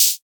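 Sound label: shaker perc; drumkits; percs; shak 3 perc; fl studio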